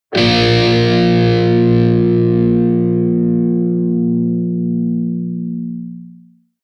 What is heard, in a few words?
electric guitar distorted powerchord low sustained